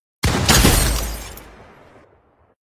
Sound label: Heavy Pump; Fortnite